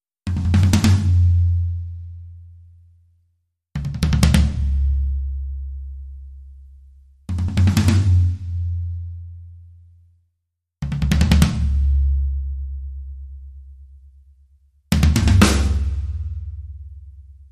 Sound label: Nervous Beats